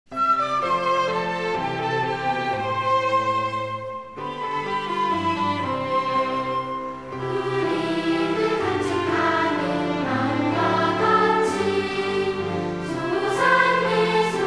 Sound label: chorus